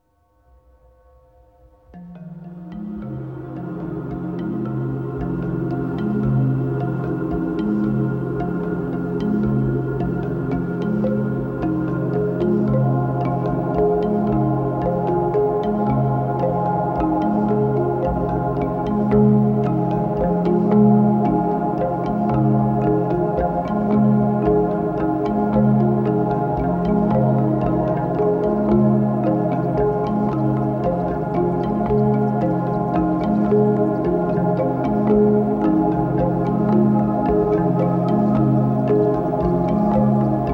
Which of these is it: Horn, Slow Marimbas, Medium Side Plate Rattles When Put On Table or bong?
Slow Marimbas